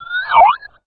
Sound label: alert, houndeye